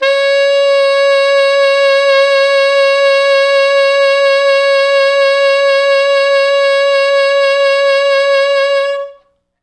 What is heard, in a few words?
T Sax C#4